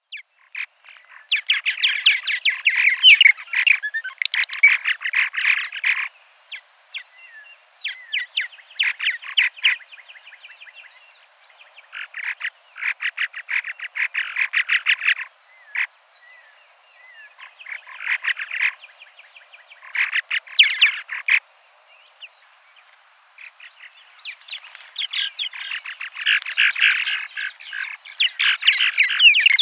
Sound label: progne subis; jaskółczak modry; ptaki